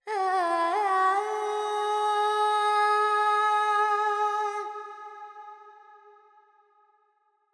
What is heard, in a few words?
FX, Vocals, Carnatic Female, Alaps, Charukesi; Sample Banks